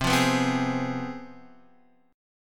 C Major 7th Suspended 4th Sharp 5th, C, down, Guitar, Open D